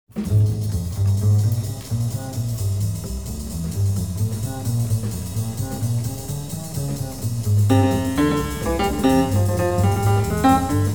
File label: chorus; jazz